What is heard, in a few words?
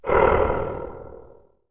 DESTROY UNDEAD COLLIDE